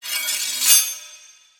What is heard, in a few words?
idle claws spark; smite; god daji skin15